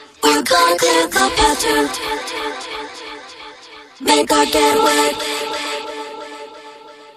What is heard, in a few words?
Electronic